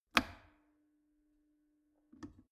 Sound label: plastic water boiler turn on off soft